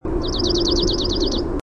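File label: Dark-eyed Junco song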